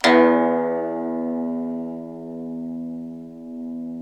SU GZHENG, Earth Tones

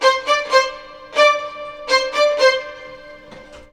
STRG, Roots Disco; USB Soundscan vol.32; AKAI

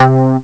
Hit Bass, Amiga Soundtracker; ST-XX Amiga Soundtracker Sample Packs

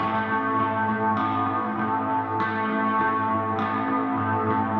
Gretsch Ring FX 100 A, guitar drone

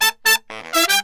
MERENGUE, World Grooves

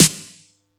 Yamaha EX-5

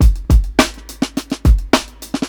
105 16th BEAT, drums; LOOPS; Basement drums vol 2

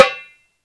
Indian Percussion Tabla; Mango Loops

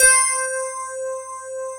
FM ELP 7 C4 L, Keyboards Old School; USB Soundscan vol.09; AKAI

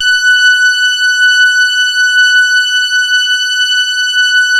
SAW, Synth lead; InVision Interactive; Keith Emerson; The Most Dangerous Synth and Organ

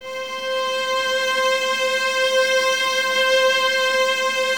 String Ensembles, Violas

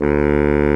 BARI SAX D2; Greytsounds VOL-1

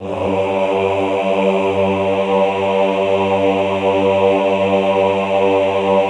AHH G1 L, Classical Choir; Best Service; Extended Classical Choir; AHH LO+HI LL

